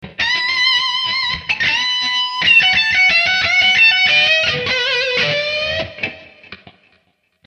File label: 122 lead